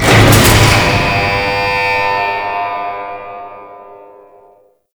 Grineer Shipwreck Rocket Start; Gameplay; Warframe; Tau

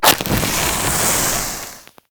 Proto Infested Maggot Spark Start; Warframe; Abilities

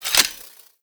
Spear Gun Equip; Warframe; Tenno; Hoplite

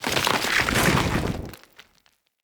Root Spider Burrow, Foley; Animals

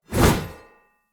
Great Sword Whoosh; Weapons; Player; Mendicant Great Sword

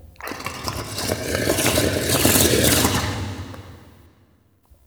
Interior Gurgle Slurps; Ambience; Indoor; Warframe; Infested Eris

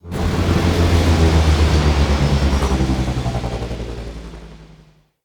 Ship Hyper Speed Cool Down; Vehicles; RailJack

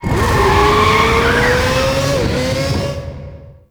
Exit Stinger; Rusalka; Convoy; Warframe; NineteenNinetyNine; Stage8ShitboxConvoy

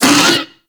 Kubrow Armored Vocal Pain; Warframe

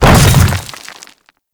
Hammer Boss Land Dirt, Footsteps; Warframe; Grineer Hammer Boss

